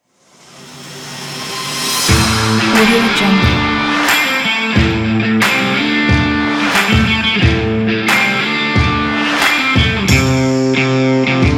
sport action rock

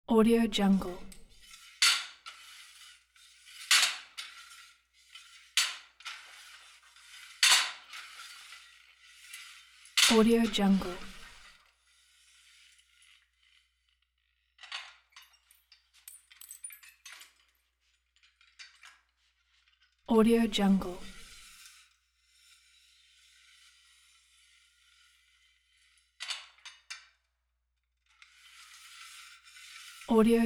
gym weight machine pulley movements

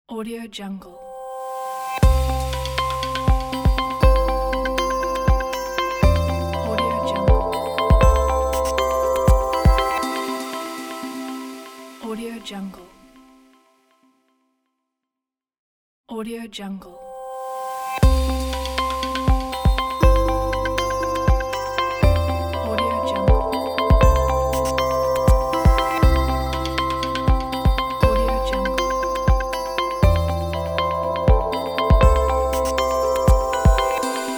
sparks glitch logo

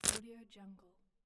board game cardboard pieces drop; stormwaveaudio

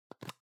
object plastic can lid screw open medium; smartsoundfx

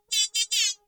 robot vocal synth like high pitched whiney

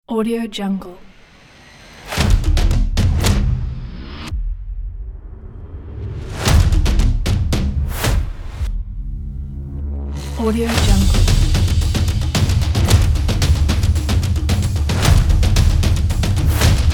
epic drum

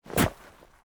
snow impact big hits semideep stomping jumping

